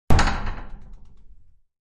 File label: chest open